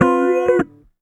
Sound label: Guitars, Drum & Bass, D; Total Drum&Bass; 90 s Sample CDs